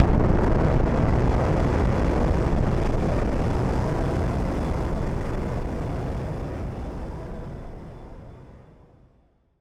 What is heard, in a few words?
Noise Bomb; musicradar; bomb factory